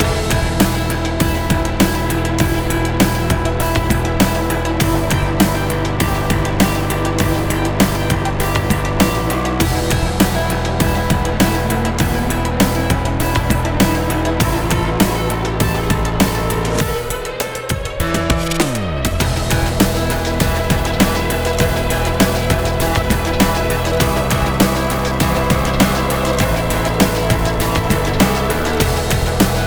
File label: Fight Loop; evacuation; windows gamerip 2023; River